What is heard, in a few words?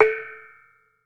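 METALOPHONE, Pitched Percussion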